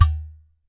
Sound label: udu hit, Udu Clay Hand Drum; ETHNIC and WORLD PERCUSSION